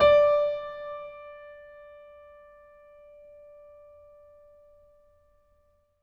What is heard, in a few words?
MIX R, Acoustic Piano, PIANO STEREO